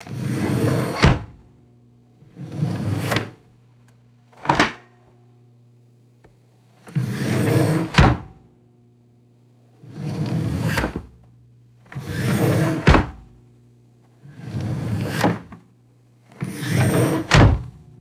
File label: wooden drawer sliding open and closed